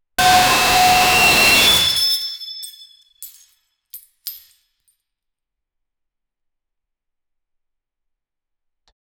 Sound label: sharp piercing scream